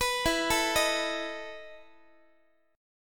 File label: E Major 7th Suspended 4th E, Guitar; x,x,14,14,13,14; 4A,4E,5A,5D,5G#,6C#; arpeggiated